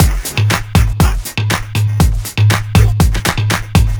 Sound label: 120 Full, Drum Loops 120bpm; Abracadabra; Nu Dance Electro